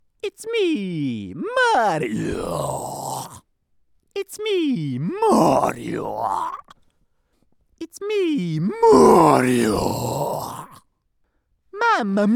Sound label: Growl